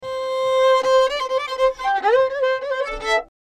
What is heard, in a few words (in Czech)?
housle